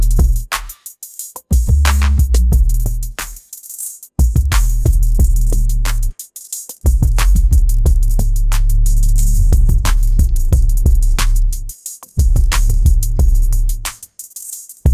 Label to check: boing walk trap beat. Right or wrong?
Right